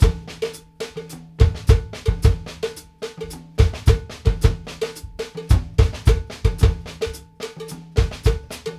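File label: cowbell + tom + kick, left; Sampleheads; New York City Drumworks VOL-1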